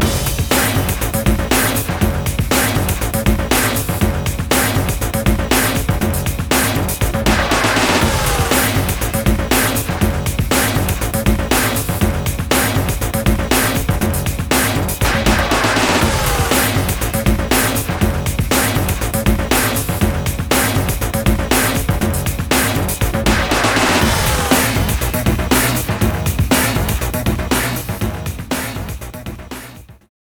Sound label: BoomBox loop